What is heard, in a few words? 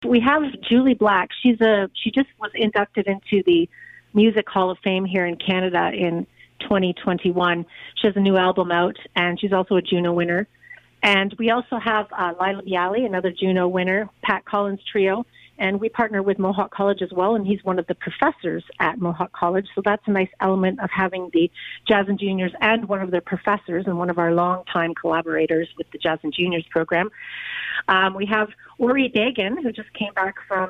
south coast jazz